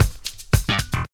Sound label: REAL BASS, BASSLICKS; Ueberschall; Jam Box